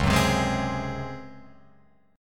C sharp Major 7th Suspended 4th C sharp Guitar Open F; C#M7sus4 strummed; JGuitar